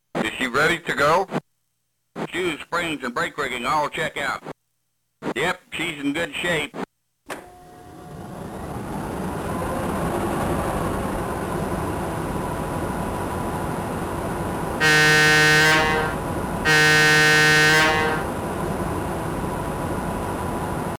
electric startup; Railware

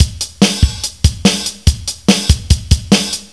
drloop; Descent

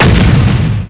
explo; Messiah; WEAPON; XPLODE

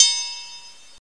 2 BELL; Microsoft MultiMedia Pack for Windows

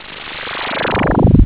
erase; LiveWire

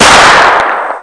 fire bang; Revolt

